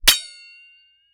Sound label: Impact Metal Spatula Hits